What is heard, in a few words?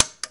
Pinball Hit Metal Gate